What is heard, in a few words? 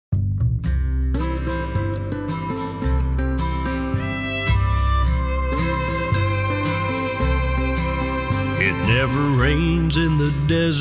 acoustic country western; full band/contemporary country